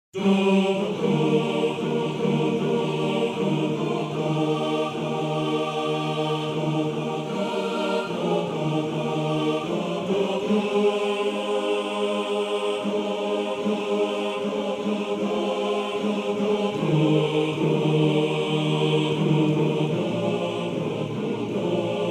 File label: Chor, maennerchor